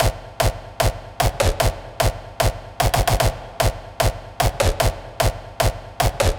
Kicks

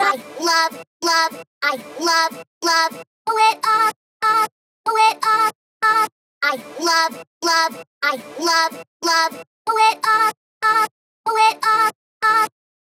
Vox Layer, 140

